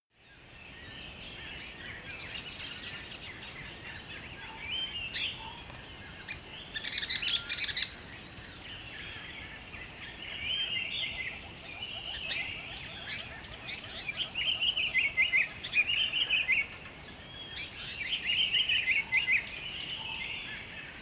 river dawn single bird, dschungel regenwald; atmosphaeren umwelt

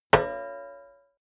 plate hit ring slightly damped short; hits impacts

